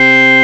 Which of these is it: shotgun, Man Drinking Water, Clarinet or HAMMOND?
HAMMOND